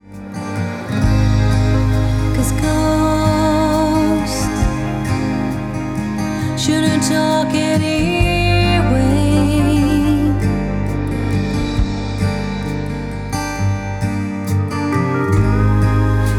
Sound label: acoustic